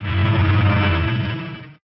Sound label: shotdown; air wolves